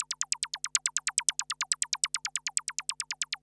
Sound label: SPACE FX 140; SpaceSounds